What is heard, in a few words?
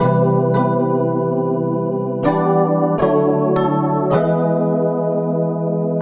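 Electric Piano; Keys; Keyboards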